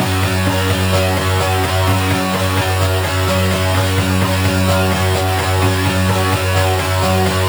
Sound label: Special Sounds Root F# 128 BPM; Vengeance Samples; VEH3 Special Sounds